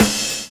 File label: RIDE FILL; Univers Sons